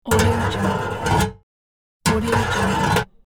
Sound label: big metal scrapes